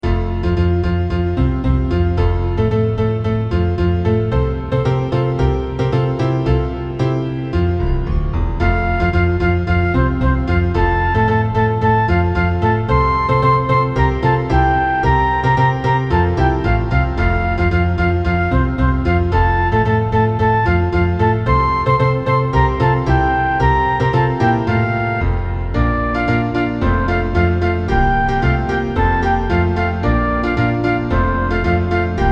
zirkuslieder playback

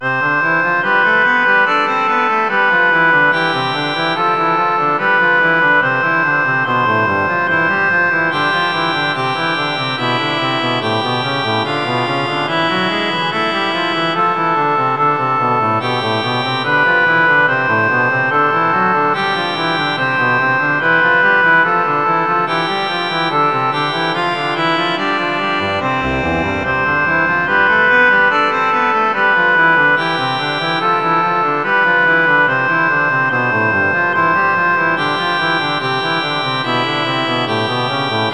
vom himmel hoch da komm ich her orgel

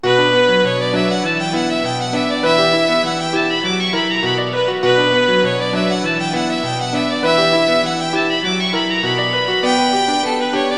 violine u klavier; kinderszenen; haschemann

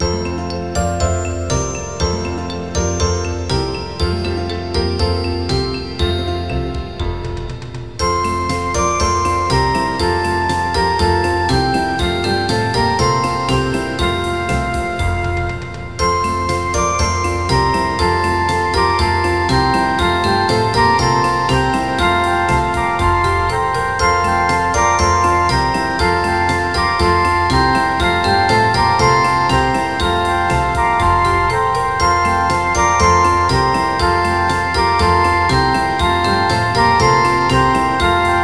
zirkuslieder kanon playback

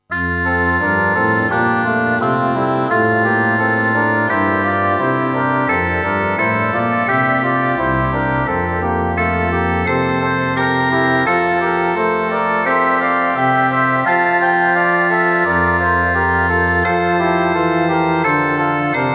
nun danket all und bringet ehr, orgel

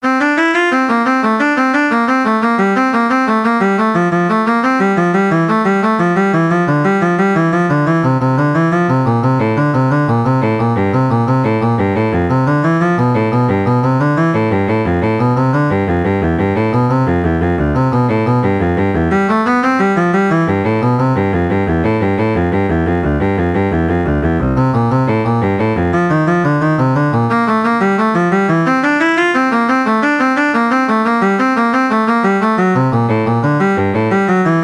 etueden cello; nach js bach

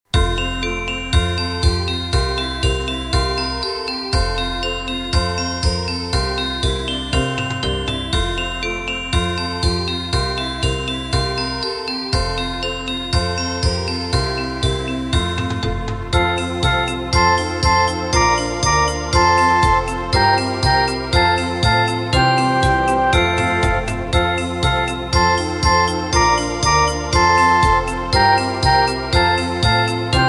kinderlieder morgen kommt der weihnachtsmann playback

